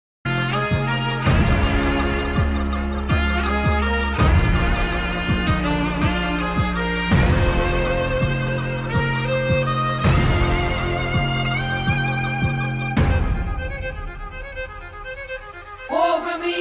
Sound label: theme bgm ringtone; kalki ringtone